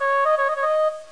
flute; MOBICLIC 14

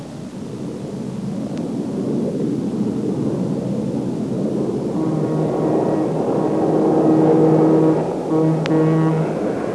train in tunnel; Wayzata Technology (1995); Transportation

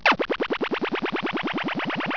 BUTTON

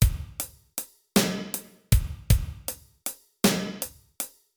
Basic Beat Hi-Hat; Pro Tools Blues

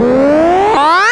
Siren fuzzation; Babylon 5

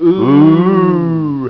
OOOH

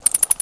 BAT; animal fun

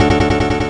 jackpot; Monster Media Number 15

